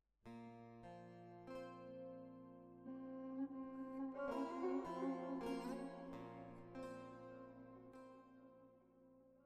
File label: sabatage sad bridge